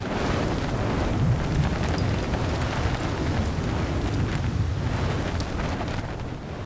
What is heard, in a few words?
ground pound wind rush; Titanfall 2